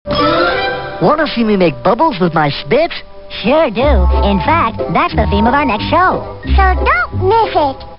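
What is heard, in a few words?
spit bubble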